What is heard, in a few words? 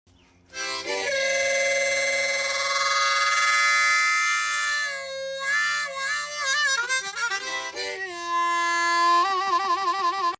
harmonica